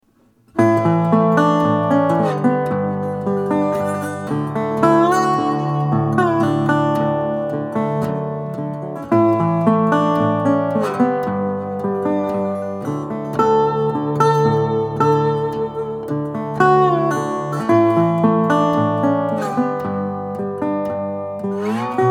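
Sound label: Trio Wood Body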